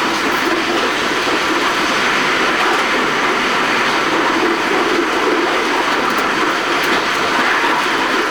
Run, Train; OpenBVE

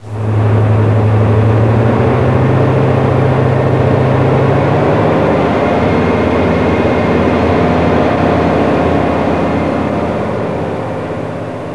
runup, Train; OpenBVE; 55022 Royal Scots Grey

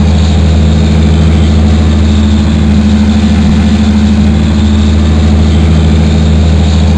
Motor, Train; M41 Halbi

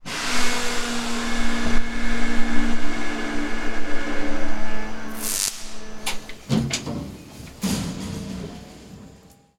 pan Down, Train; OpenBVE; Cl313 Silverlink

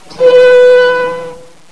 Klaxon, Train; OpenBVE

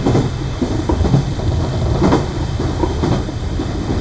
Run 2, train; OpenBVE

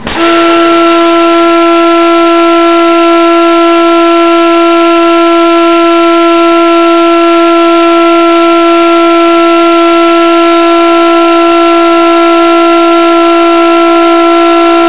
AWS, Train, CLASS 47; OpenBVE

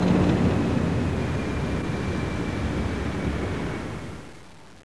engine stop, Train; Y8420 ter; OpenBVE

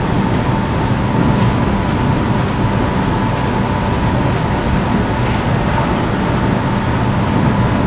Run, train; OpenBVE

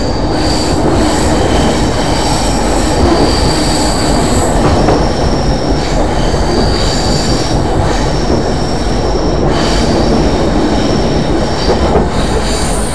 flange, Train cl158; OpenBVE

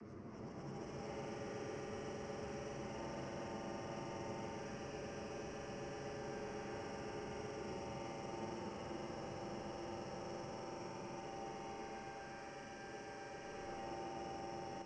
Cp start, Train; OpenBVE

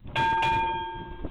Crossing; OpenBVE; First Brno Track

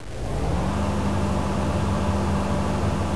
blower start, train; APT; OpenBVE

